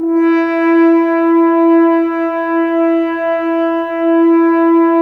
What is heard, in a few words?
Brass F.Hrns E; Miroslav Vitous - Woodwind Brass Ensemble